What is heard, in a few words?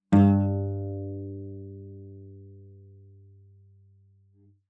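Cello pizz fortissimo sul G G2; MIS Pitches - 2014; Strings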